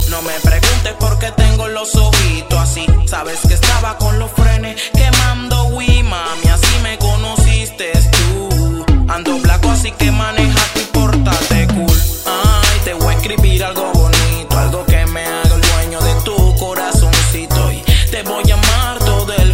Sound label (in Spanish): algo musical; latino